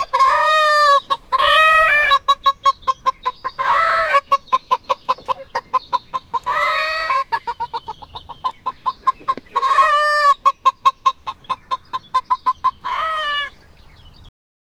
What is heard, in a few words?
chicken farm chicken sound